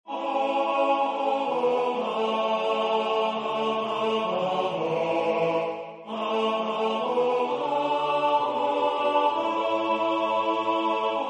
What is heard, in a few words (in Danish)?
tenor; Min Jesus, lad mit hjerte få